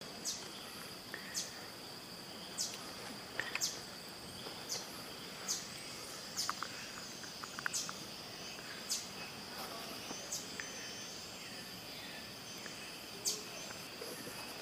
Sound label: Green Honeycreeper